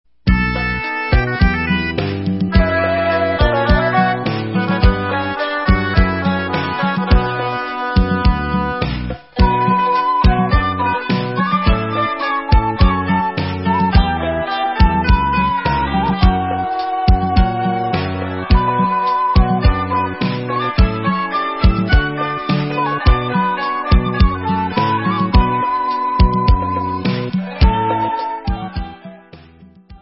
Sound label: Instrumental